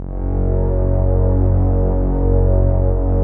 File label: rich mellow, Rich Pad; Optical Media International; Sonic Images Library